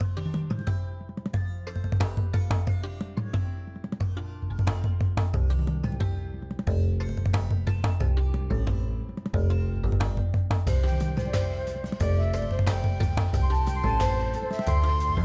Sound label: Trio Music Menu; Doodle God Puzzle Collection